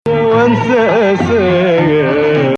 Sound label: Nahawand